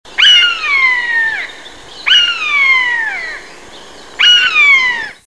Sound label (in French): buse; nature